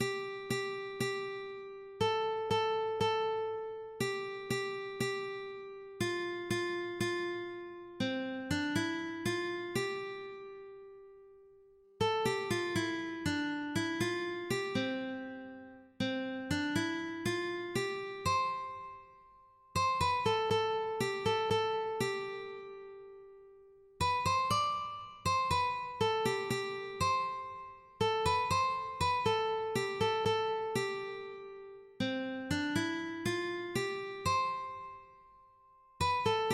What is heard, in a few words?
lavie, ukulele